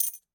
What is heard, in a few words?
balloon take